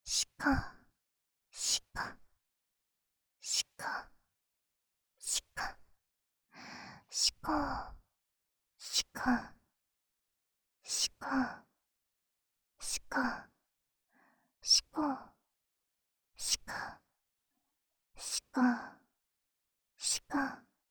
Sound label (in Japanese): シコシコ